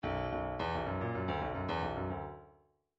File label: bdf midi file grooves funk e funk e